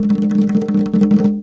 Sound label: Arabic percussion; Arabic Percussion Kit 3